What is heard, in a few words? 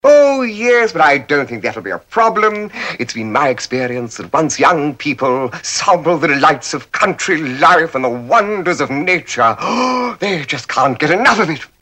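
Is Calling R&B, ringtones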